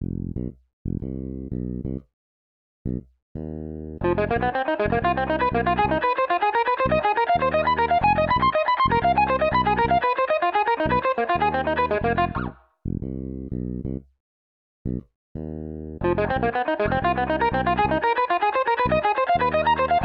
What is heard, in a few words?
Blues Outside The Box Examples